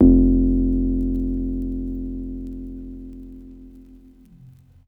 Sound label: TB303 Organ Vinyl Synths F0; vinyl keys